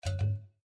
Pop-up Blocked; Windows 7; Savanna

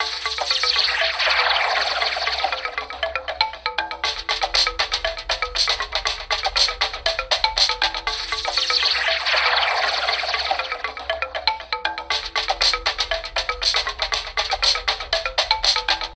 Tv Music

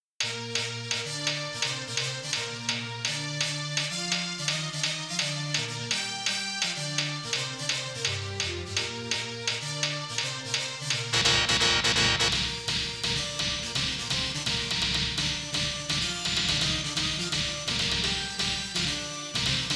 Ten Green Bottles; Lemmings; PC-Engine

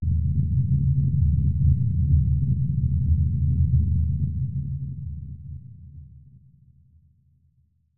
Planet Ambience; Archer Maclean's Dropzone; SNES

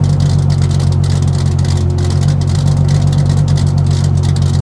Plimouth Barracuda Idle; car simulator 2

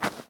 Ground short; devour

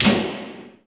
Switch hit; chibi robo photo finder

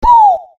Landing

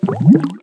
Object Exit Water

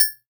Deflect Impact

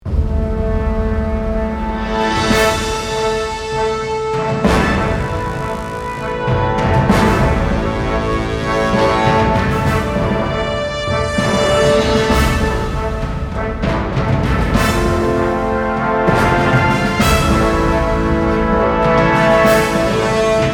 Explore A 98 bpm; Disney Infinity 2.0 Marvel Super Heroes; Arachna

